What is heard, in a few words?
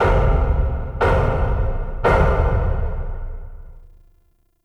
Mirror Defense Start Wave; Warframe; Entrati